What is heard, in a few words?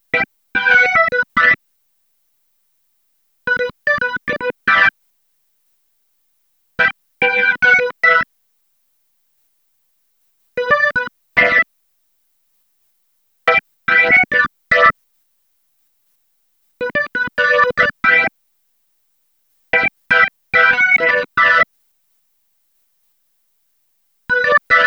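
Dirt Org Hits, organ hits, electro lounge; Sonic Foundry (Sony Creative Software); 072 Green Street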